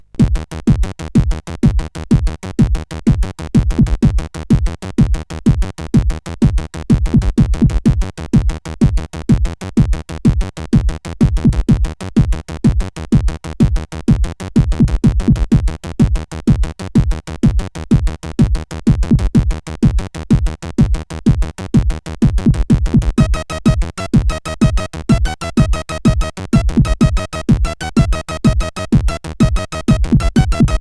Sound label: Techno, sid CSG8580R5; Zool